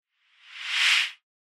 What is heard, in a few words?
Noise Sweep Mid Reverse